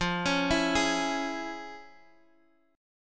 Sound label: F Minor Major 7th Sharp 5th, Guitar, DADGAD; 15,16,14,13,x,x